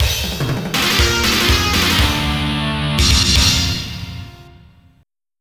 Area Clear (Alt); Mutation Nation; Neo Geo CD

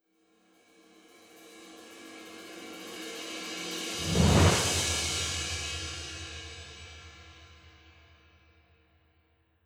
Loop Rise B; Legend of Keepers

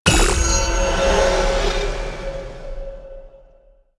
ui academy reward popup

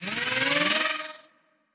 Engineer Absor Drone

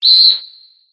Sfx American football

